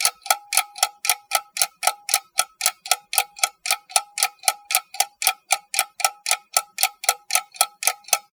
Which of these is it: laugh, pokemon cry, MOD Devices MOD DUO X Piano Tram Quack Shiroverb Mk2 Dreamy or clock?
clock